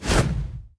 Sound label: basic Attack; tower necromancer